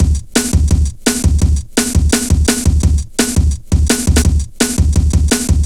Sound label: Steppa, Jungle, Drum Loops 169.5